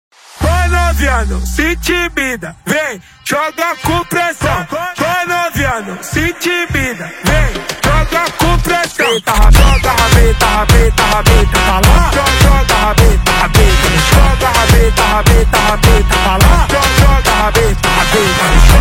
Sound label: Phonk